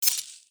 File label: close menu